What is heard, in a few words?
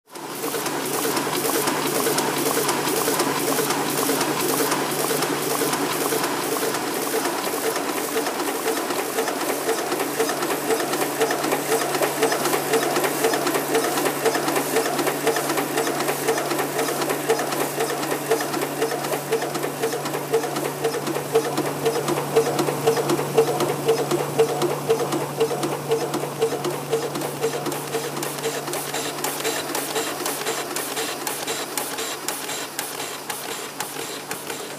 printworks